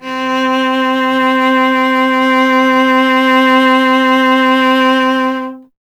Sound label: STR cello C, Cello; Miroslav Vitous; Solo Instruments VOL-1